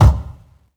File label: rich mids kickdrum c key; tuned drums